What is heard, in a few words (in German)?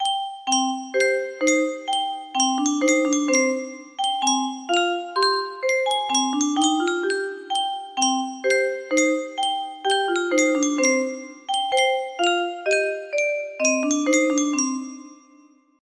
Yunsheng Spieluhr, music box melody